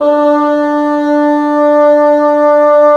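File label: WND BN, wind bassoon; LA Composer vol. 3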